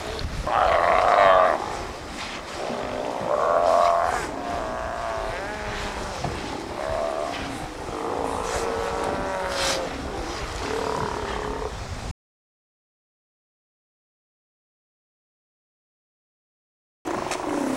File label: Steller sea lion audio; Jim Nahmens